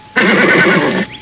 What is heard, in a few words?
WHINNY